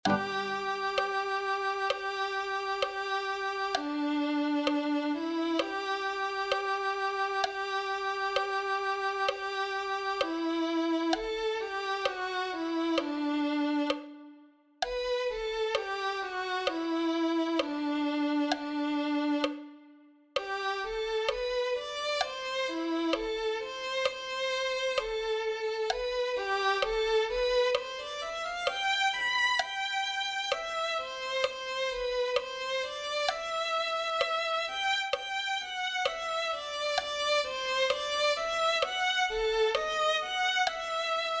violin

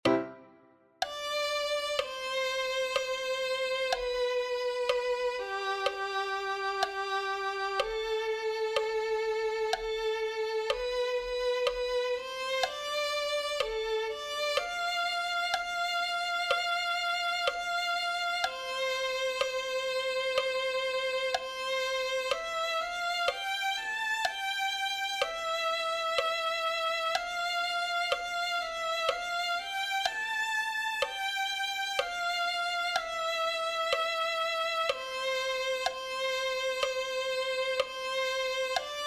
violin

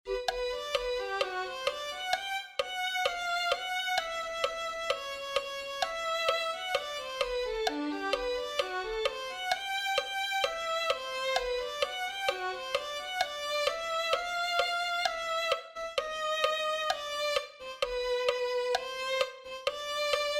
violin

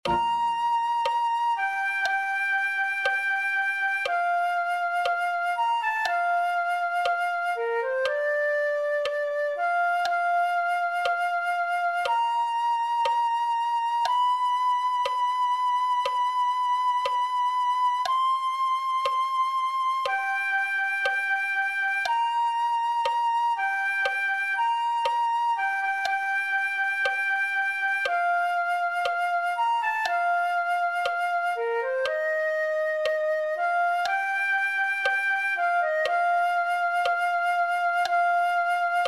flute 1

